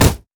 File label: blue mech melee basic attack; minions; LoL